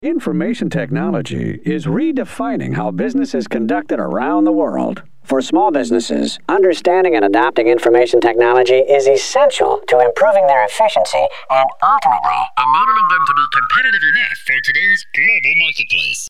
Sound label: voice manually up